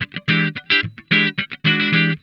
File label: Funk Guitar; Zero G - Funk Guitar